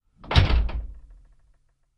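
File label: Cutlass Interior Door Close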